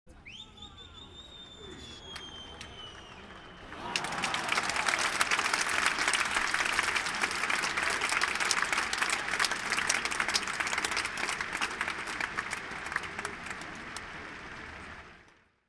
svist i aplodismenti

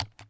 myagkoe spokojnoe nazhatie klavishi

